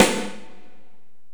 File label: Korg x5, Drums